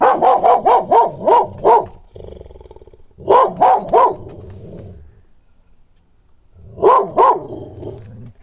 aboiement

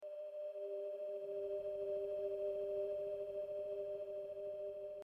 tenue sol re

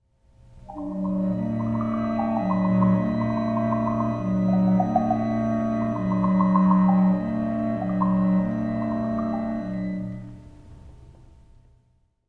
lignes points

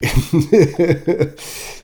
rire homme

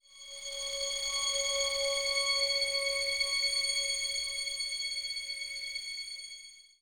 cosmique

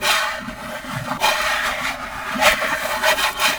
frottement

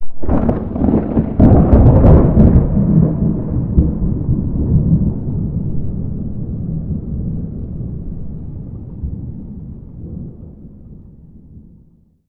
grondement tonnerre

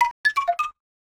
oiseau flute

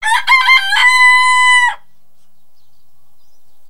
coq

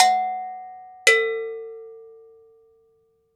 cloche de vache

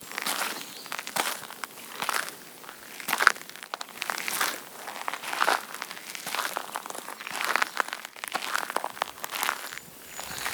pas gravier

